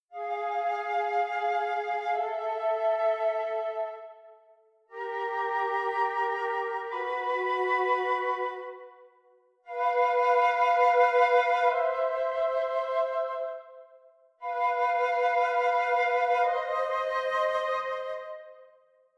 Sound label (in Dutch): dissonant oplossing